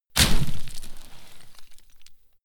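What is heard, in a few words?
step gravel step, footsteps; schritte; menschen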